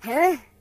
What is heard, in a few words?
Hmm, Surfer